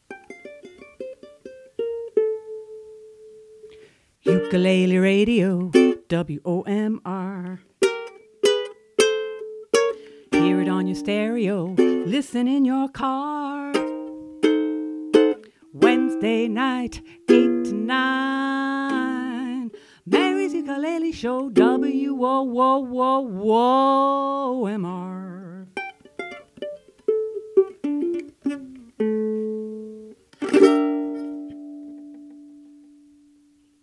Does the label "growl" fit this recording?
No